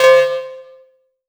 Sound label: LEAD Dark Kord Makker; Polyend; Plughugger